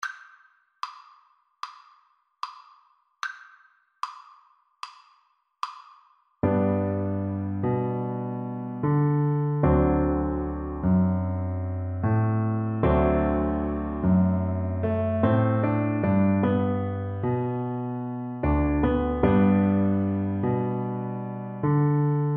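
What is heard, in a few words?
VLC karaoke, Cello; Hush Little Baby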